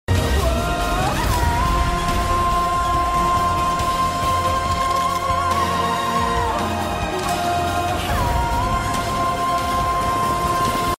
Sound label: whoa hoooooo